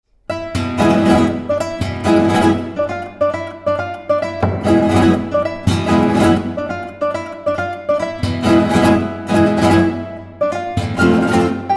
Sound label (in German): Gitarren Duo